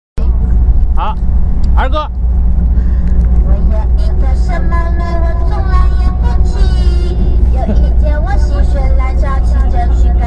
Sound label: road sing children